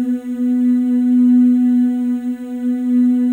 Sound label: FEM, Symphony of Voices; Spectrasonics